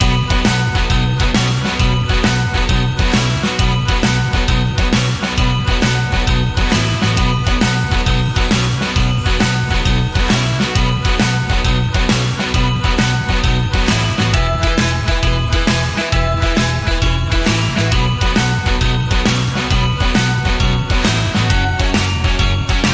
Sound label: Rock n Roll Christmas